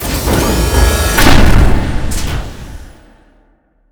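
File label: door close; Cosmic Rage; ships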